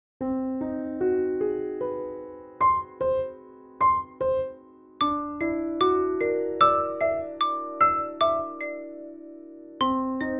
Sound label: piano xylophone; destik fine rain